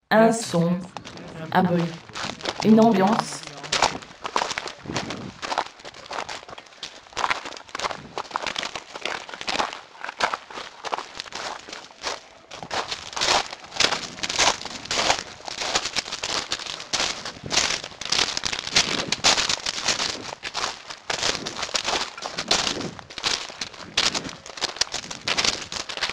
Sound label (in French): Un son un bruit une ambiance